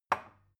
glass put down cupboard soft

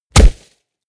body fall snow low punchy

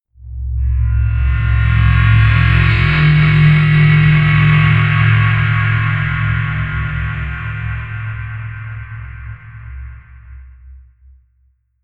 whoosh metallic rumble

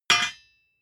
hammer hit steel clank

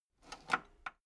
metal lever button radio disable slow

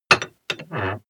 moving metal massive

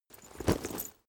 leather metal impact soft

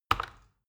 plastic button tiny click heavy fast

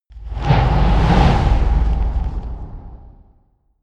whoosh flutter

